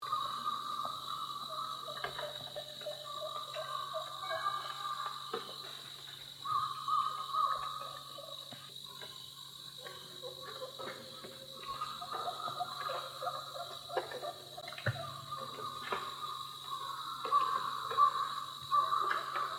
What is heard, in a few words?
Morning Turkey Roost